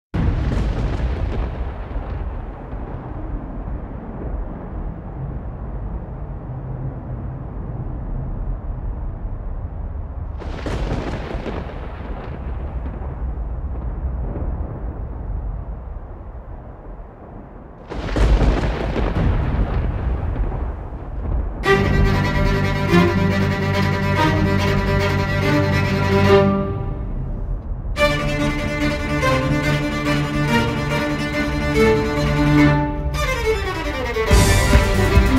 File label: remix electro violin